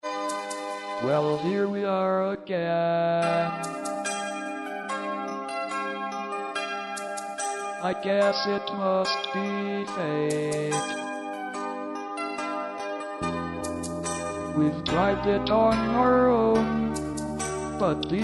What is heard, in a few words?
MALE ONLY